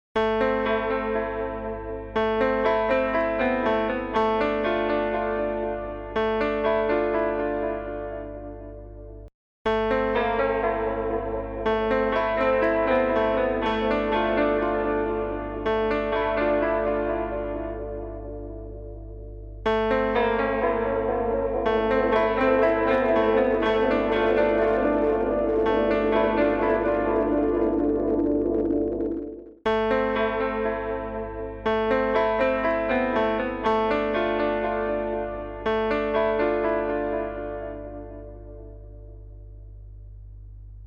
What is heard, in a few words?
Piano Tape Echo